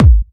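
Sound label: BD Trancy, bass drum; Takebeat; Club; VEC1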